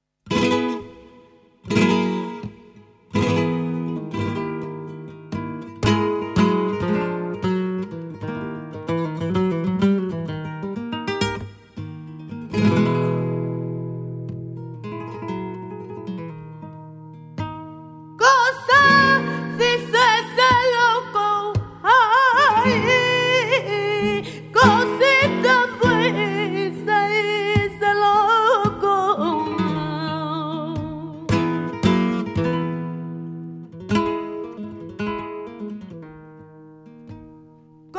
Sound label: Solea, flamenco; de Frijones